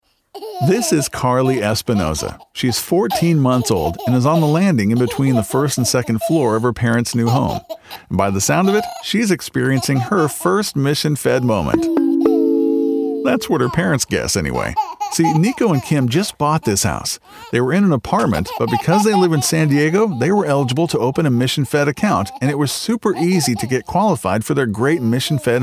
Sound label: Radio giggles